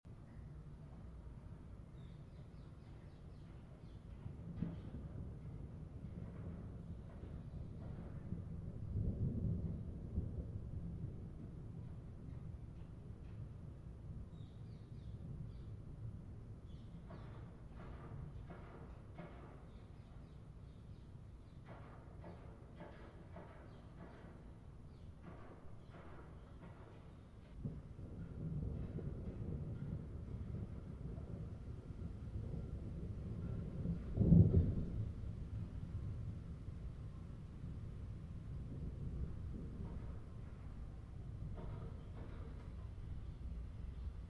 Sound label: thunderstorm with rain and hail